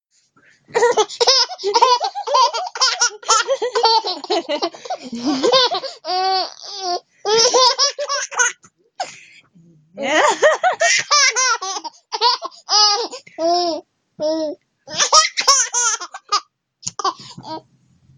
Risa Bebe; Antropo Fonia

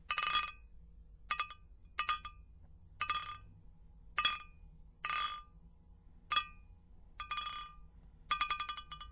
Botellas

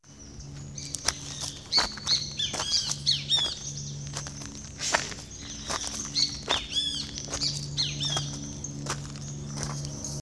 Pasos En Piedra

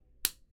Cachetada

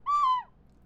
Gaviota

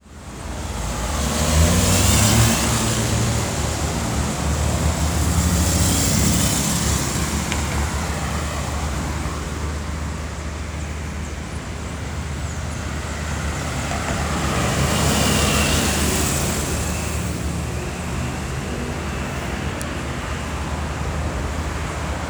Ambiente Ciudad

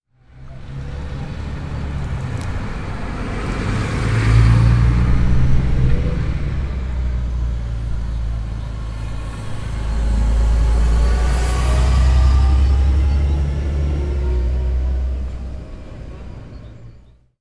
Autobus

